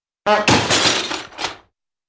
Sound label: honk and aluminum crash